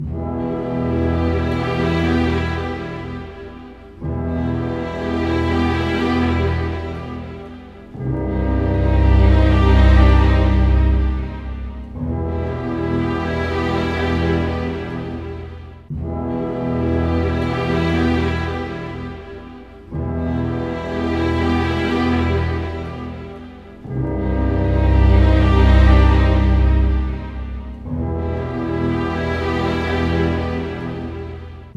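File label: 60 dark, orchestra; East West; Scoring Tools; Dark Side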